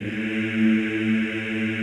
EEH, Classical Choir; Best Service; Extended Classical Choir

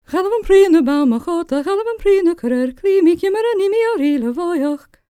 GAELIC, World Voices, GAELIC FEM

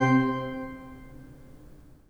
PIPE, 3DOrgans

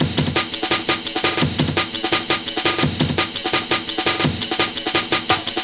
Kickin Break, Drum & Bass, Beats & Breaks; Fluid Dynamics

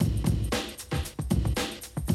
Classic Drum Loops

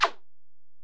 EPerc, Alesis DM-PRO

